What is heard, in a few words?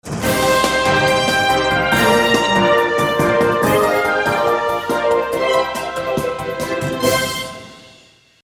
Intro Outro Music; newscast